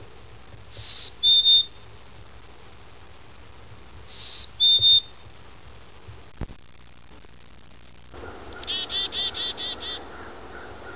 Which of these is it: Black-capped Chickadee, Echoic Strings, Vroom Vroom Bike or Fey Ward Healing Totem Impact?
Black-capped Chickadee